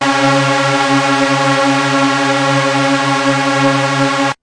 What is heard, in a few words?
bass C sharp 3; instruments multisampled